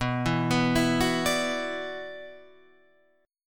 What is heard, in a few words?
Gb 7th Suspended 4th Sharp 5th B